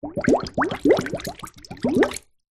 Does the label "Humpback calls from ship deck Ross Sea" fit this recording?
No